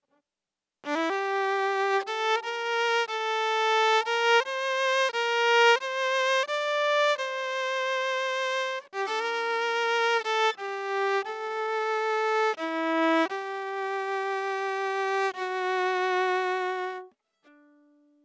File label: Violin